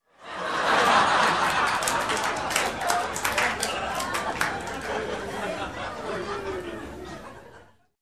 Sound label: Crowd Laughs and Claps